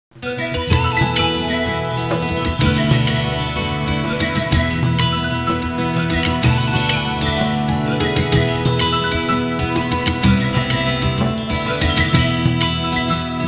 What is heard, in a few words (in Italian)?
suonerie musica hawaiian